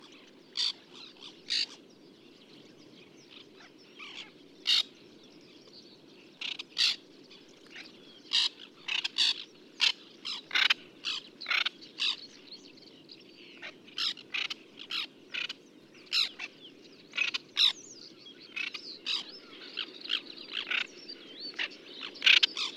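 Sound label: white-winged tern voice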